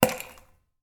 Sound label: Corn Pop